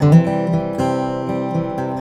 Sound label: GUITAR 120